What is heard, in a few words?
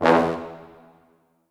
E1 R, Orchestral Brass; Best Service ProSamples vol.20; AKAI